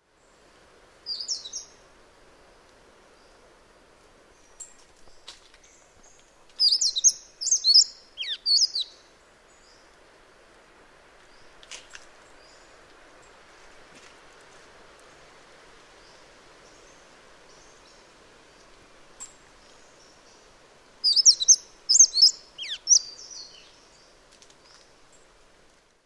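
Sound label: Yellow throated scrubwren, bird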